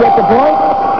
ding

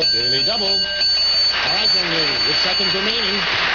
daily doub

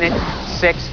box lose time

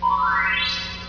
next line; usa

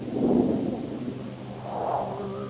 fire cave

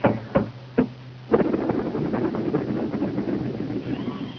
dice roll

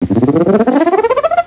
open board

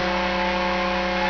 buzz

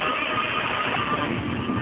saw blades

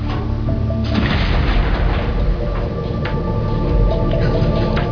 vault open

